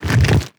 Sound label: regular, zombie blind, foley; creatures